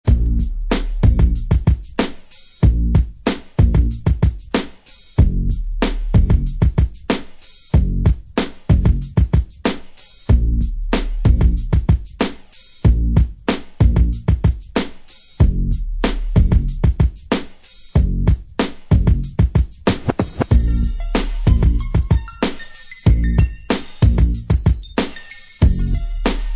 Instrumental

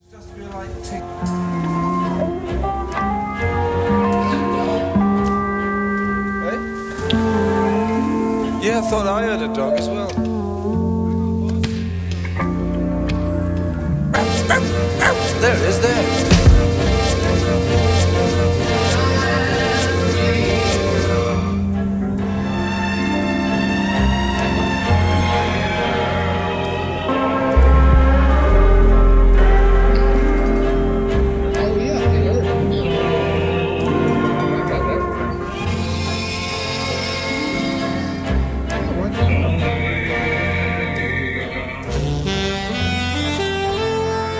Snare Drum Mic

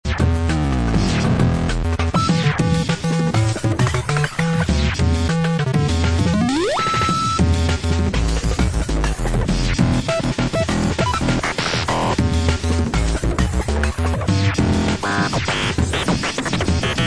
the modern bass guitar

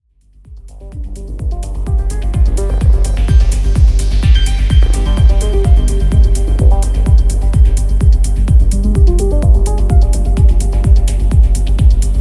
Bionic Harp

